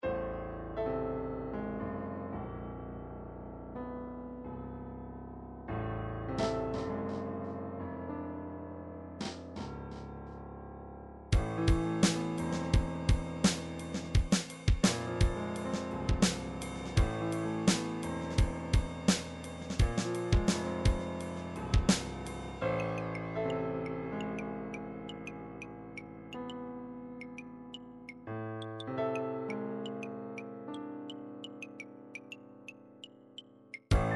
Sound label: triphop morse, midi; Newtronic Triphop Construction Kit